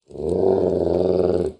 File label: growl; Animal Sounds; Various Animals; Short calls